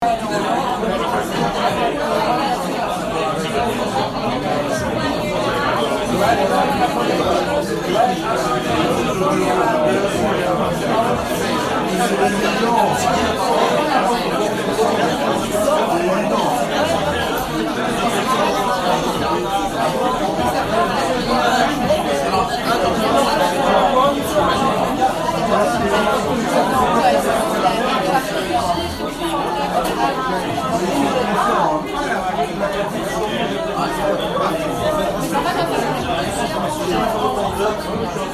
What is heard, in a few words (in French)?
bruit salle de restaurant; table ronde nuisances sonores troubles du voisinage